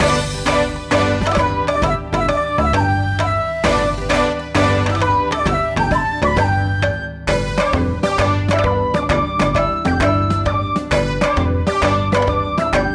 Medal; Spooky Spirit Shooting Gallery

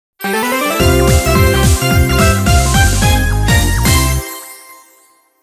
Music Success event

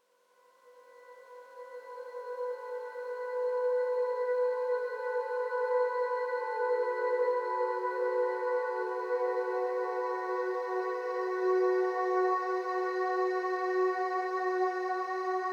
Crystal SOS tone Klang; Inside

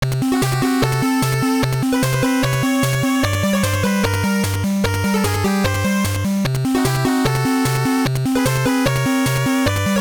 BGM; Gakkyuu Ou Yamazaki; GBC

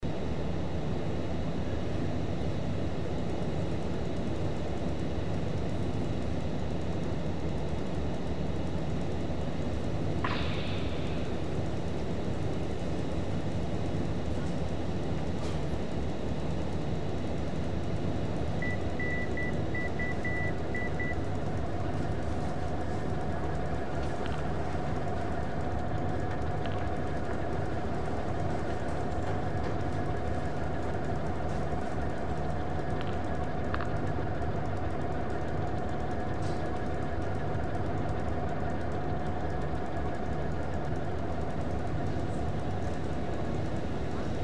Music Noise Of Shop; Star Souls

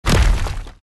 Pillar Impact

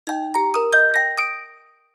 success; Piloteer

